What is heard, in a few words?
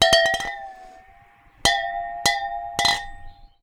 Water Clink, Glass Clink In Water